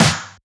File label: Snare; FLPRO